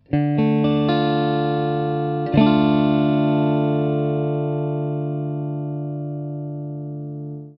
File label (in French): RE mineur, Guitare, Accords ouverts